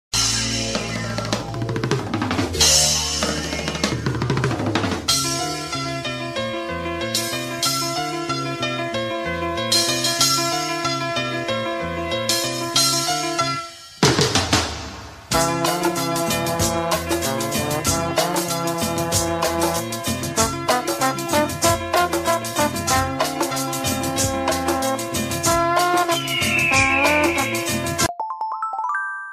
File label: famous bgm ringtone, south india